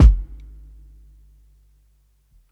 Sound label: PICCOLO KICK; ONE SHOTS; KICKS; breaks; Basement drums vol 2